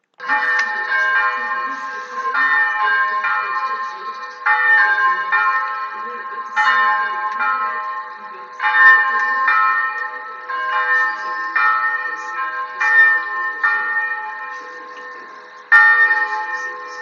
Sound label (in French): CLOCHES